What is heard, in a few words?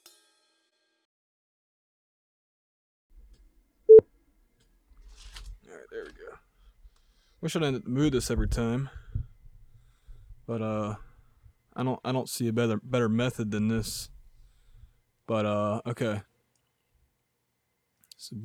wavesh eq overheads